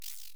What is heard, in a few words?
spider buddy jump